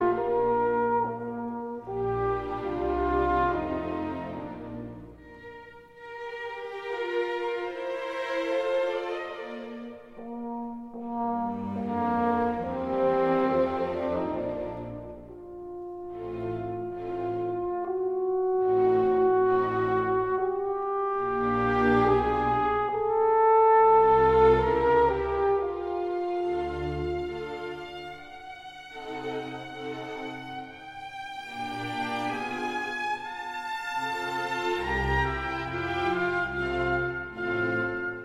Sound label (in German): Hornkonzert; Wolfgang Amadeus Mozart